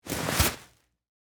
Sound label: Sand Bag Pick Up; Pick Ups; Objects; BlastWave